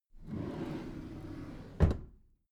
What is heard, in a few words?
packed drawer smooth close soft